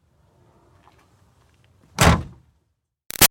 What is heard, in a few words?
Car Highlander Trunk Close; 2005 Toyota Highlander V6; Cars (Sound Effects:Vehicles); Big Room Sound